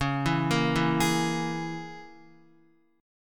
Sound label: Db Minor 6th Db down Guitar; Dbm6 arpeggiated; JGuitar